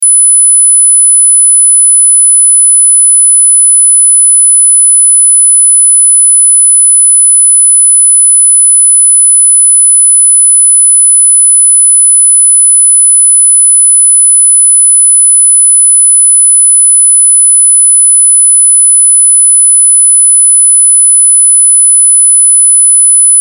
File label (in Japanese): 周波数10000Hz